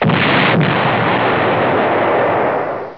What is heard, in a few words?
explosion; lgeneral